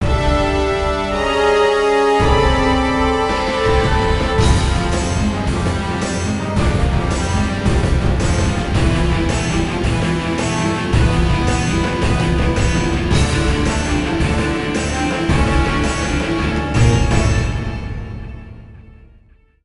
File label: Sting Norse Battle; Age of Empires Mythologies; NDS